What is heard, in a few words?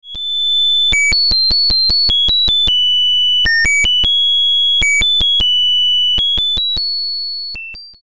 tealight sound